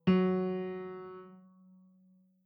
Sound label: piano f1; through abandoned 2 the forest 2016 gamerip pc